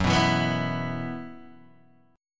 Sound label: F sharp 7th Suspended 4th, Guitar, DADGAD